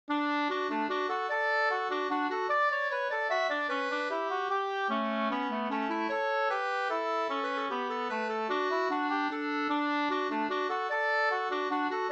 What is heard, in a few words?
mazurka; Nordstroms mazurka 2st